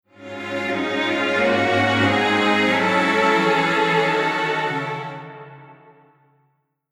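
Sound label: Strings Polyphony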